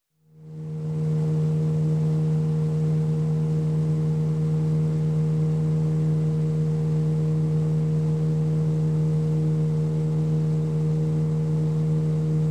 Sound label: Boat Outboard, 20 Hp - Driving Along, Marine; Sound Ideas; Series 2000